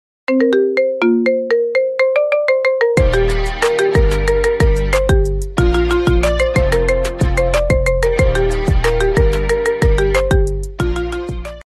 iphone mood ringtone; android

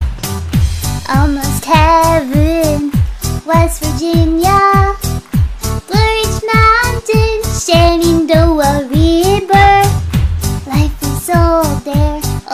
uwu ringtone

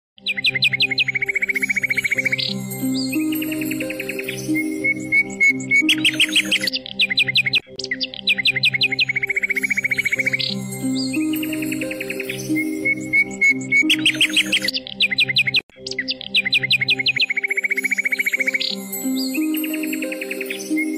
birds ringtone; android

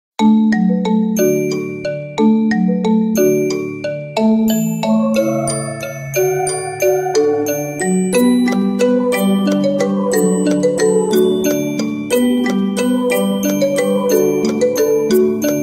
silent night song

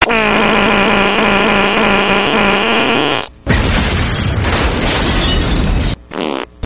long loud fart ringtone

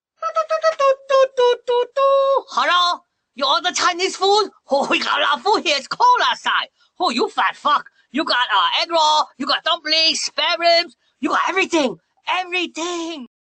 china ring meme tone ringtone

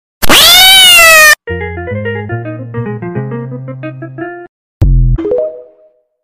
miau piano

